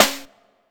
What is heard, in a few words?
SD, dance drums hip